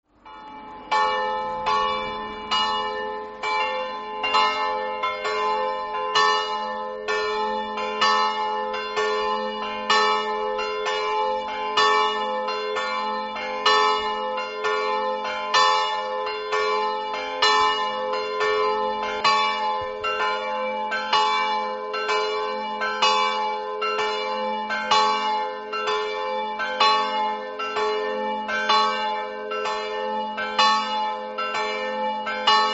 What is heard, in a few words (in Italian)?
campane; leproso